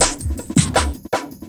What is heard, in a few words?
Loop, Drum & Bass, 160 BPM